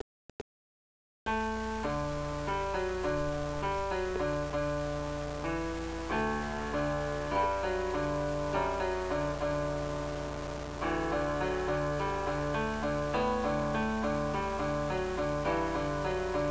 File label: piano; Composer- Sanjay